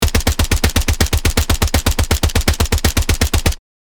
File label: M4 assault rifle full auto fire